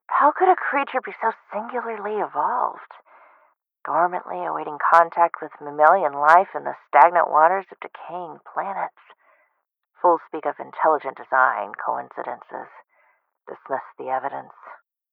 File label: Throat Leeches; Mothership; UCR Audio Horrors